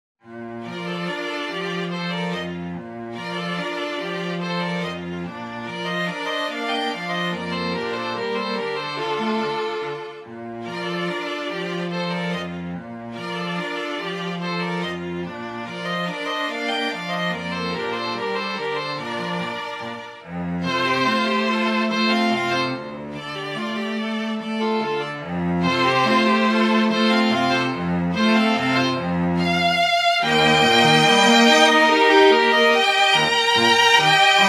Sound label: QUARTET, Strings